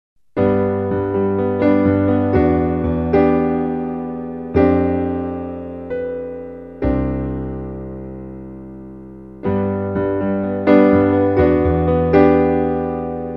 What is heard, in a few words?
Keys